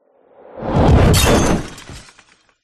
sound design shockwave whoosh into glass and debris smash